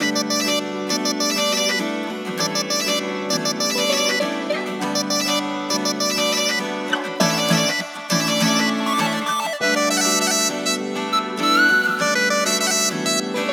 Bohag drop loop BPM 100 D Maj; Bihu; waves of bihu vol 2; Gully Loops

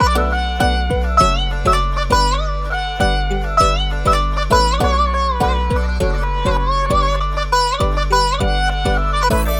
Melody Loop BPM 100 D Maj; Gully Loops; Mahi

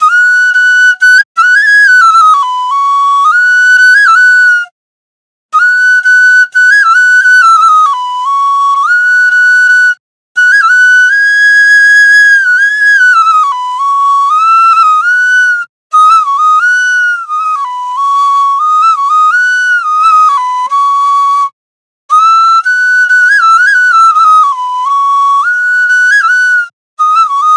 Flute Loop C

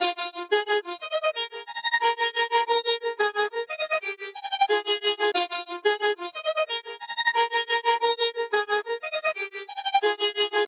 Baraat bonus loop BPM 90 F; Gully Loops

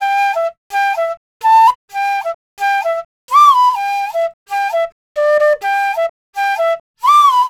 EDM Flute Loop 128 BPM D

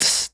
Beat Box Crash; aawaz

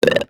FX I am weird; Aawaz; Gully Loops

